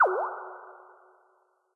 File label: water